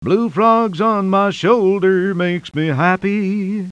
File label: frogs